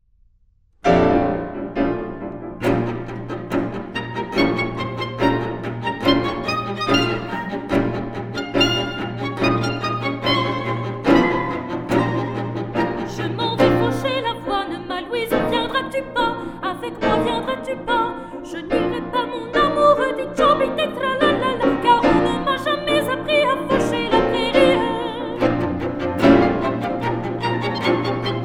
Sone Cornouaillais voix; vingt chansons du patrimoine

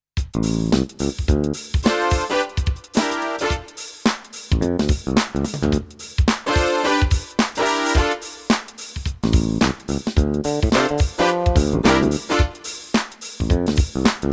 mazurka et danse; Barouf; barouf au musee

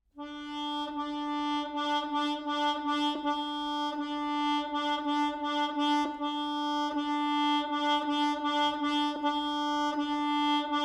instrumentale

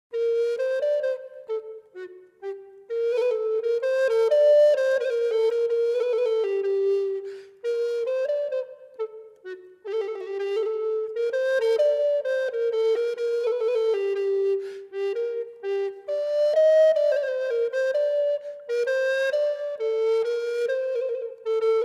baroque flute bec grave; airs sérieux et à boire

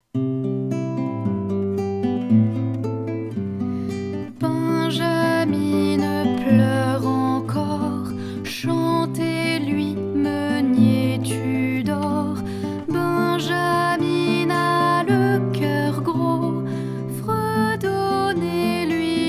comptine

